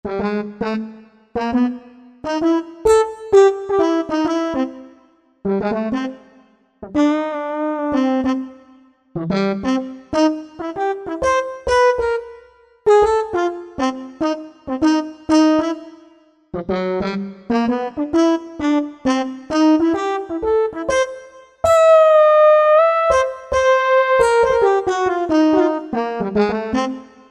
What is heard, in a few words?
Brass Talk, Korg MSC-05S